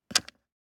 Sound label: DICE on Plastic, Grab, Standard, 6 Six Dice; Samples; ArticulatedSounds; DICE Sfx Party (Extended)